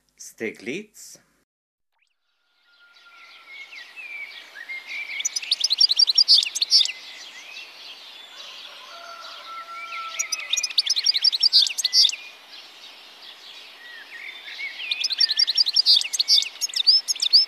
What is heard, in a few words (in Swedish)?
steglits